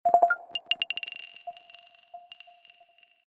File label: Glass Ball, notifications; Tecno Spark Go 2022